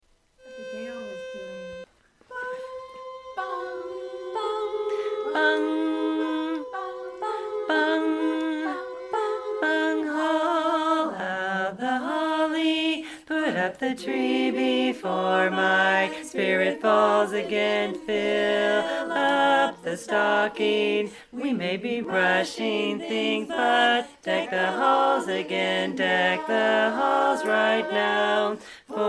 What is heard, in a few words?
bass